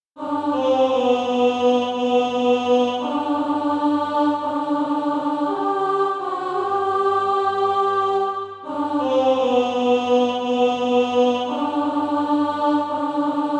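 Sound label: alto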